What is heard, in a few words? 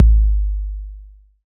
BD 909, TR-909; 90 s Sample CDs; Sound & Vision - Gigapack I CD 1 (Roland); TR-808 & 909